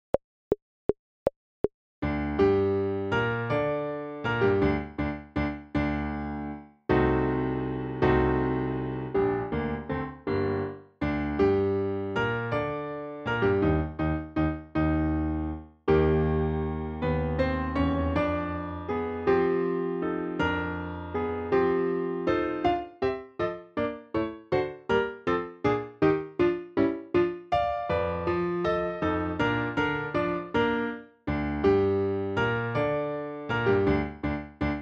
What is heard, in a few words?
Minuet and Trio Accompaniment 160bpm; grade iv; backing tracks